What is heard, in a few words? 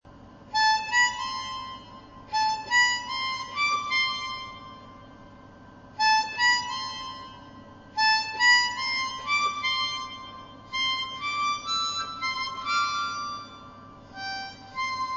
wind, harp